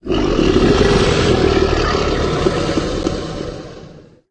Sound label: die, creature; stalker